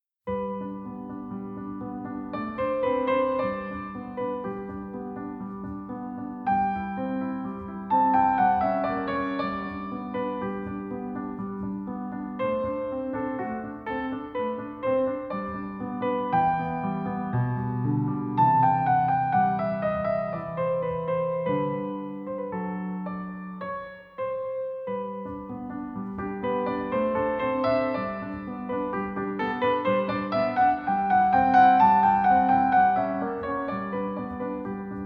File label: Piano Sonata No. 16 in C Major K. 545 II. Andante